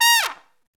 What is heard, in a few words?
SOLO TRUMPET